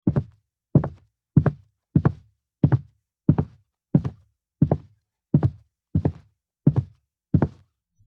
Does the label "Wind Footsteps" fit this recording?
Yes